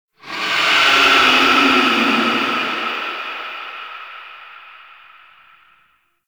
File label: Sentient Use Ability Verb; Enemies; Warframe; Tau; Sentient Dactolyst